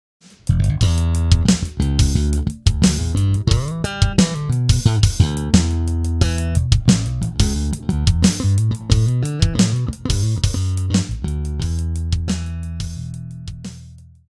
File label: Slap Intro